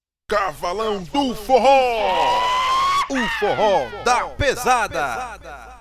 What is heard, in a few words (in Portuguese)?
vinheta